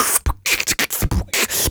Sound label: Human Beat Box 140 Pop; Loopmasters